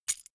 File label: key ring mid size catch; objekte; schluessel